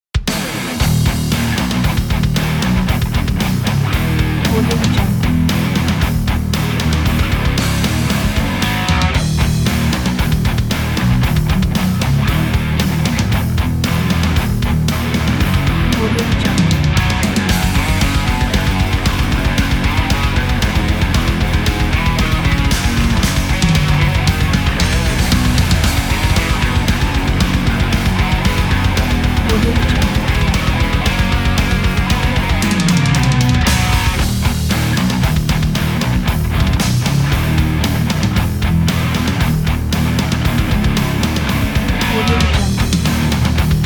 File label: jingle bells aggressive metal version; bosone